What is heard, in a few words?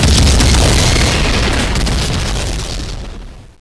in the hause explo; de smallcity